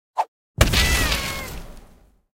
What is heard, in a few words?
Partypopper; candy crush saga gamerip